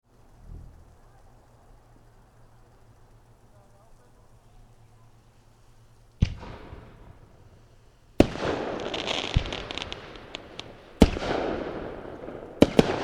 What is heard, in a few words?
fireworks